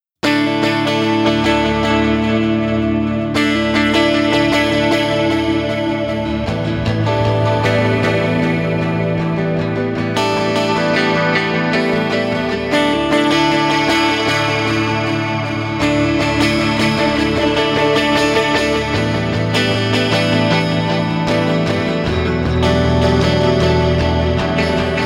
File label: Modulated Clouds